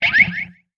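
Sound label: Music Guitar Pick Scrape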